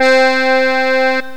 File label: accordion, organ